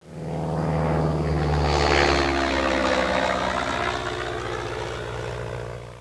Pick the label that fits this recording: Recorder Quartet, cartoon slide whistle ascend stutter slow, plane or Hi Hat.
plane